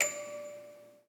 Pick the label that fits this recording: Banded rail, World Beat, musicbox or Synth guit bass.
musicbox